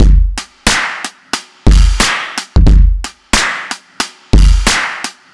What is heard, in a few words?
Rimshot